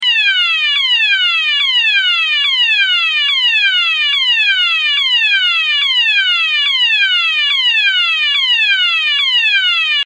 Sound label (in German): Ton